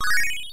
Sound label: ring